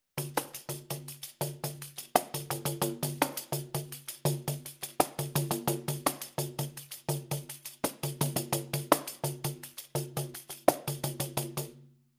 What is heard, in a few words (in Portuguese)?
samba marcacao slaps variacao, pandeiro